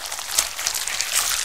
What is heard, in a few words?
slimer idle; dn3d r